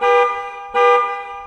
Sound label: car Alarm Loop